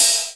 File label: Roland R-8 Crash